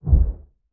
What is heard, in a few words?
Bass Impact Whir Syn